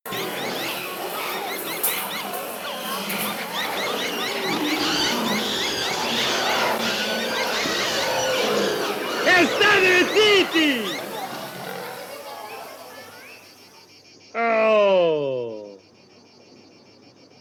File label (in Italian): suono centro commerciale, il rumore da bazar, folla del suono; Un'ora di suono centro commerciale, il rumore da bazar, folla del suono per il sonno